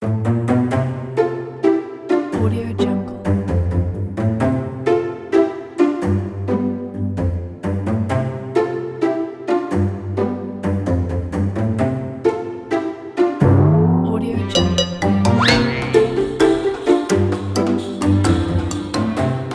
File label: funny